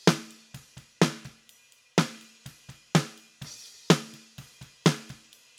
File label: snare phase in